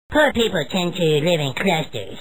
Clusters; Furby; Hacked Hot Wired and Channeling Cartman

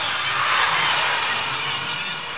cheer; Arduino Mini Arcade Basketball Hoop

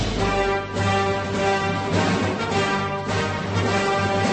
march

